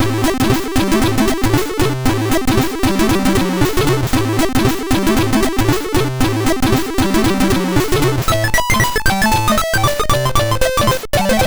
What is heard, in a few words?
Intro sid MOS6581R4